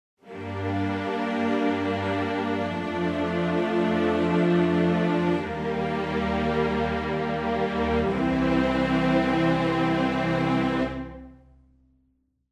Strings Full Ensemble Sustained Dynamics Modulation